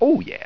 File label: got letter; Hang2000 v1.1